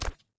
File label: footsteps; trando; Star Wars Obi Wan; XBOX